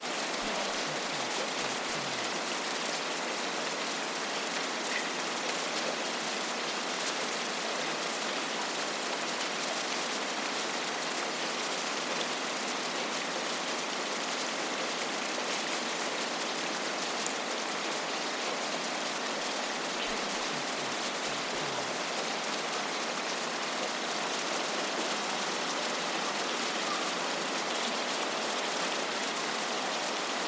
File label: Rainforest Rain Ambience; SoundsCrate